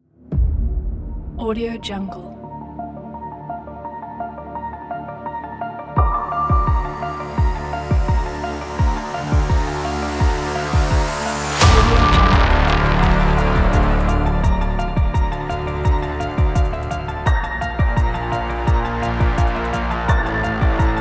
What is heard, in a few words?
scifi trailer titles intro